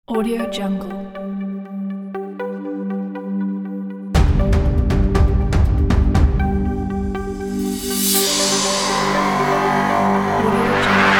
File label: ident logo